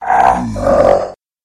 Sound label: monster swamp muck